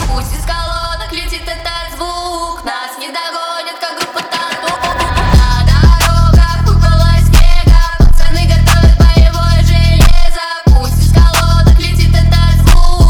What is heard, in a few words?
Phonk